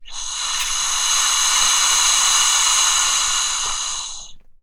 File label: Wind, Melodica